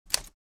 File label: Typewriter vintage key pressing